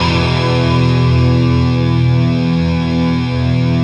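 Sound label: hazard chord, guitar; Spectrasonics; Bizarre Guitar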